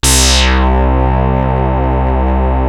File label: OSCAR C2, synthesizer; Hollywood Edge - Giorgio Moroder Rare Synthesizer Collection